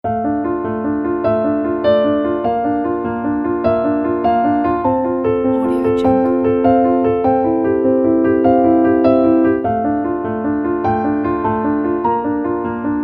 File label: light inspiration piano